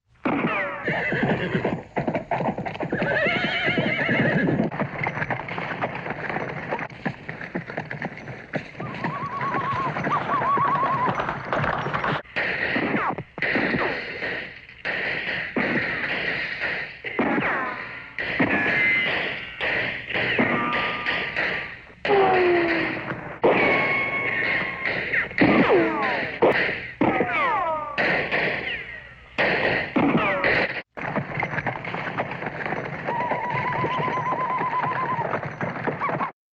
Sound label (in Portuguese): som country tiroteio indios